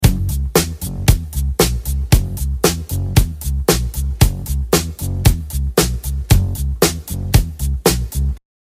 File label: drums and bass